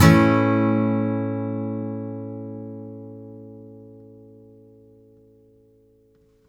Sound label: MAJ A UP, Blues Guitar; ILIO; Fretworks